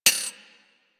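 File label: machine gun mech; Weapons; Archwing Mach Gun B; Warframe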